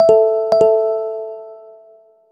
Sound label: incoming call; bbm